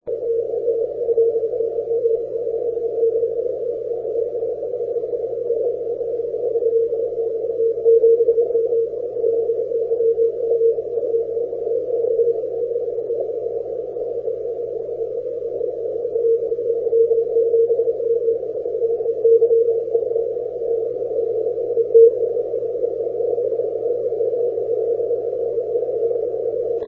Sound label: 80m CW, CQWW CW; CW-LP